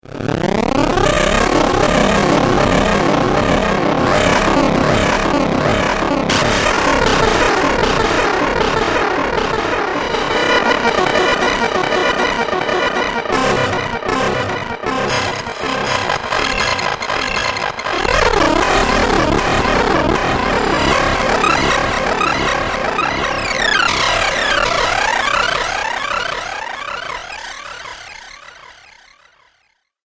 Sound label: 8 Bit Style Glitch Drone; Video Game Sound Effects